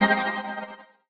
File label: light impact; MagicArsenal